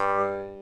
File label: JEWSHARP; ETHNICSTUFF